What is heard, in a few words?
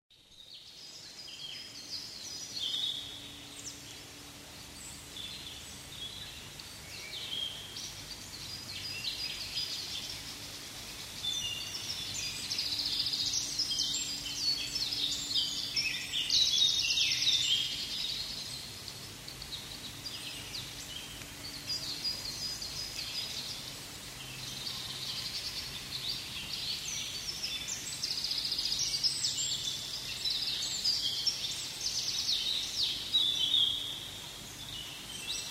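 animals birds; Zwa; Poylakia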